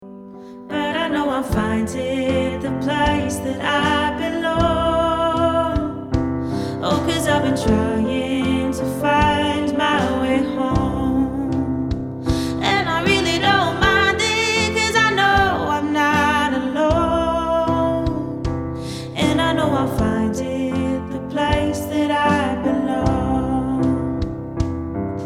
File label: Custom Song Sample